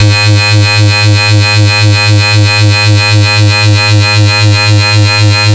FS (G#2), FightSynth; neuro; Stanza; FightSynth Multi

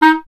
Clarinet D#; Miroslav Vitous; Solo Instruments VOL-1

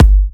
G, Kicks; markus hakala

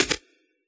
SCR, Yamaha DJX, B900 Kit

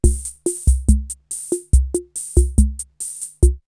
CR78 R77 BeatBox, drumloops, DrumMachines; X-Static Goldmine

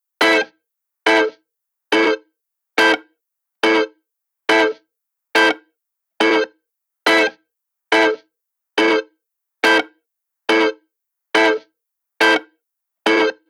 140BPM Amin Guitar DRY, Offbeat Dub Guitars; Antidote Advent

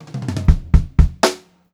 FUNKY FILL, Funk-Groove Drumloops; USB Soundscan vol.38; AKAI; 90 s Sample CDs

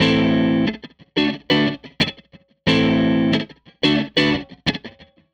Strat Guitar Loop

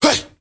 MALE RAV, MALE NOISE, Heart of Africa

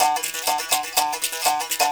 Percussion Loops, Brazilian; Houseworx

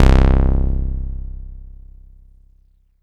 Moog bass, synths

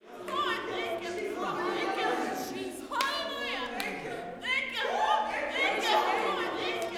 WORSHIP, WORSHIPAMB, Vocal Planet, Gospel

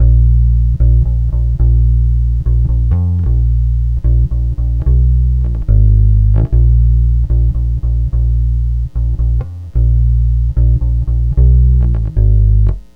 Bass A#; Lounge; Zero-G Kocktail Kollection; Construction Kitz; Volcano at Sunrise 074; 90 s Sample CDs

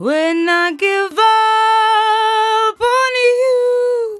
57bpm Am, Velvet Vocal; Zero-G

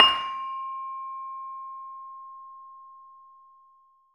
LAMELS C5, Asian Traditions; USB Soundscan vol.60; AKAI; LAMELOFO

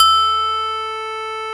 LOG, VS LogPad

